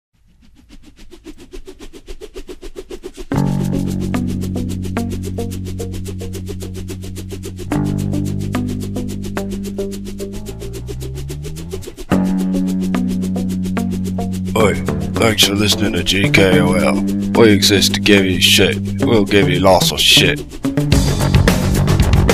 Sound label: aussie thug bump